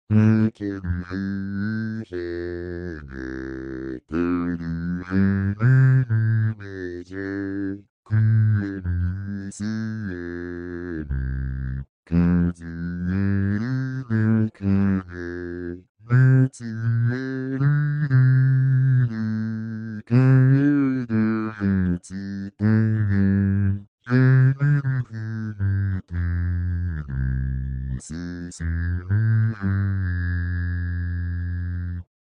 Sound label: Guitar